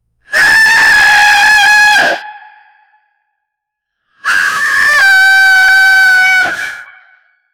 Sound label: a cawing of a very; dangerous